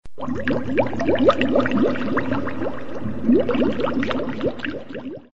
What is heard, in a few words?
Water Pouring